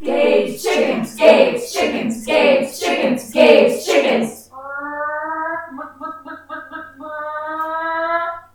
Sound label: chickens ringtone